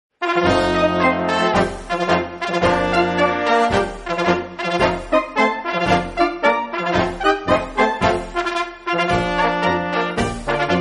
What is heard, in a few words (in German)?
Waldlermarsch, Kapelle